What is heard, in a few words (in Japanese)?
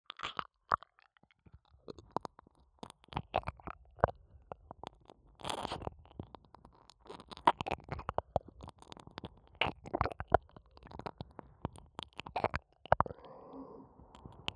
左耳舐めループ; ASMR; 耳塞ぎなし